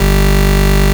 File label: Bass Shot G